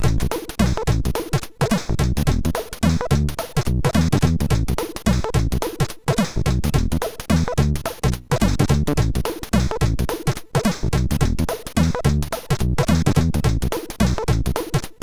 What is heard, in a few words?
Funky, sid MOS6581R4